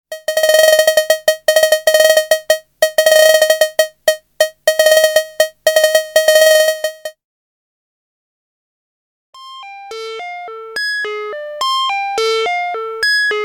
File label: EMS Logik